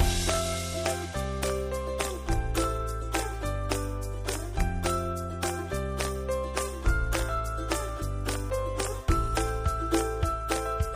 music cheerful; bgm

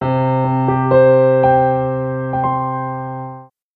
grand piano

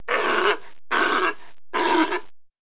sea lion

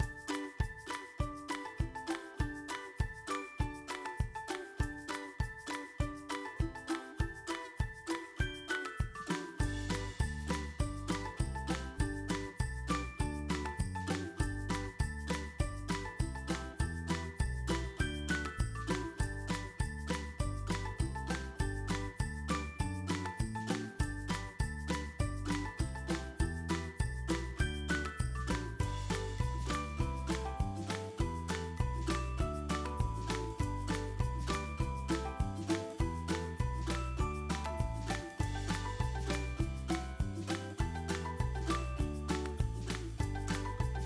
music cheerful

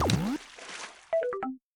wrong answer water splash drown

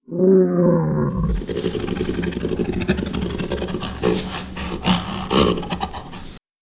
gorilla

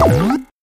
wrong answer

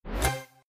pop up

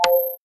blocks touch

